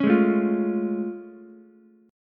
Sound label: A Augmented Major 7th A, Ukulele; strummed